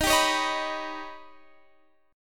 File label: D sharp Augmented 7th D sharp Guitar Standard; strummed; x,18,17,18,16,19; JGuitar